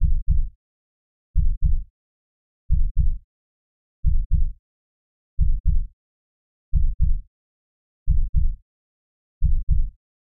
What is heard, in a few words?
Heartbeat